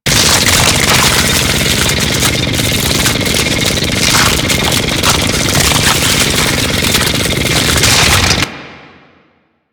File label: Mining Drill; Ambience; Props; Warframe; Corpus Railjack